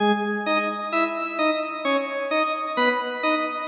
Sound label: mystery organ stab 130